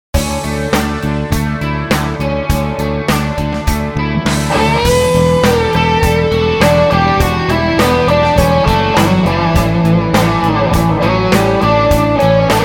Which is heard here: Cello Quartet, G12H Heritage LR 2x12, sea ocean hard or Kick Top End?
G12H Heritage LR 2x12